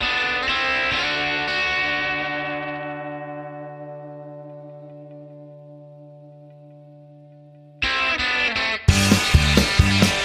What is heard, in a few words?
theme music ringtone; jackass